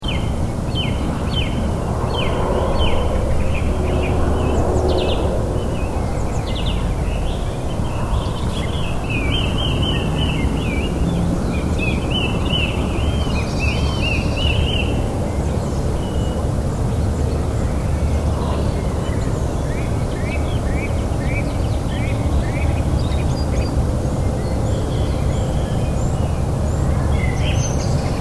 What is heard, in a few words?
songbirds